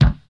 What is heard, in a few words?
rich mids kick d key, kick drum one shot tuned to the D note. Loudest frequency: 329Hz